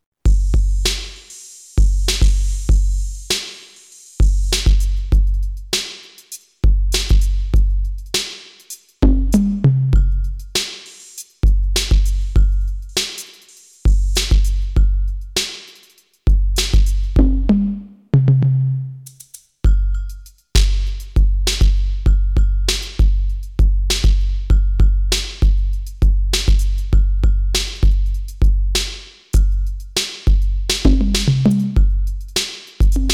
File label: ALESIS Debut Kit Elektro